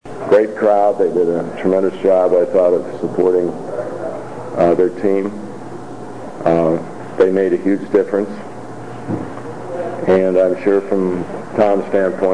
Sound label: Great crowd; Houston Cougars